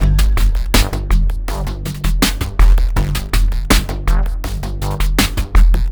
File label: 081 HipHop Combo G min; Digidesign; Pro Tools Essential Loops